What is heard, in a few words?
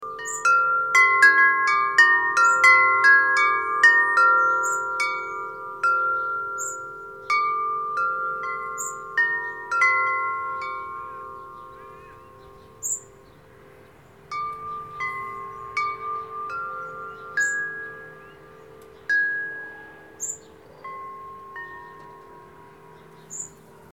Windchimes